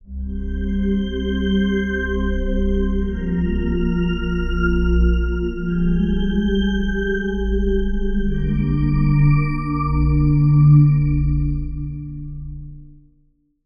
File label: Pad; Routed Sound; Serum 2 Expansion - Star Scavenger Vol.1